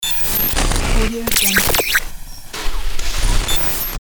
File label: radio defective structure; soundwood